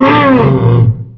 death seq; weapons; dota; creep; roshan

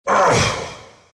dragon pain; dota

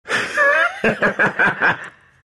vipe laugh; dota; viper

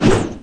preattack; dota; creep

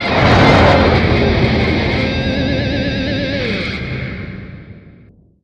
arc gesture; weapons; dota; skeleton king

